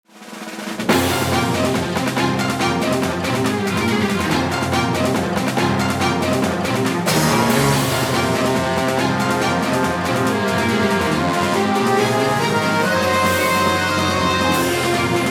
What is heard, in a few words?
March